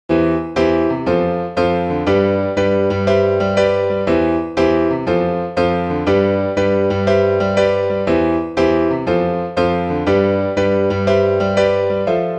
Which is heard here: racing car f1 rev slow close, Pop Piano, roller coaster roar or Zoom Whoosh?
Pop Piano